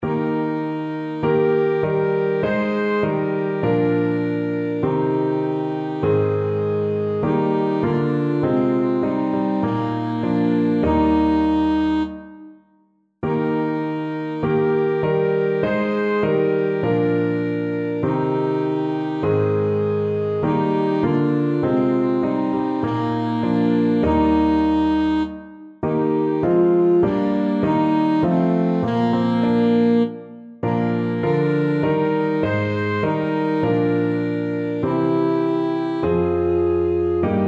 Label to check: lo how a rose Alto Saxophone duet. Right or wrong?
Right